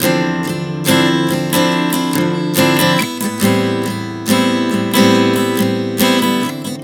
Progression 070 Dm9-Am9, Acoustic Guitar, Chord Progressions 070 bpm; Sonic Foundry; Songwriter Acoustic Guitar Companion